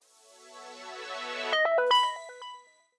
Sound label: startup; KE770 Shine